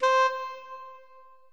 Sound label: soprano sax